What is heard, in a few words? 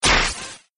thunder sound; Arc of Templar